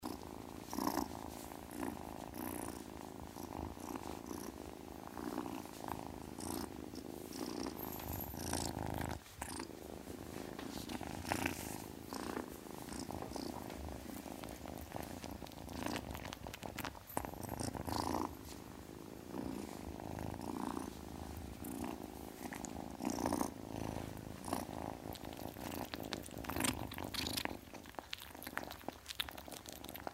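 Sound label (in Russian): kotenok murchit, kitten purring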